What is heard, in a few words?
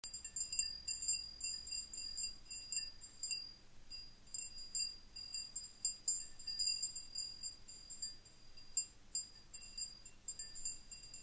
xian 5 stick, Windspiele; Glas; Metall; Klangspiel; 5 Klangstaeben; Modell Xian